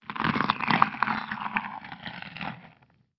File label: rapt bite